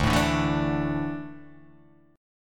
D Suspended 2nd D down

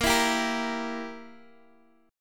G Minor 6th A sharp

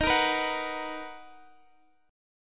B Major 7th Eb

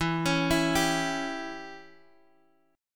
E Minor 6th Guitar Open F; arpeggiated; x,11,13,11,10,x; Em6

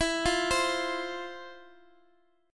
F 5th E, chord; F5/E arpeggiated

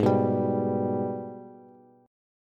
Ab Minor Major 7th, down, Bass; AbmM7; strummed

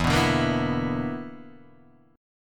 B Minor Major 7th Double Flat 5th, chord

